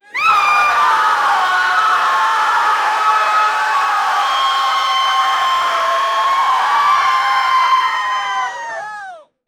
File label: crowd cheer screaming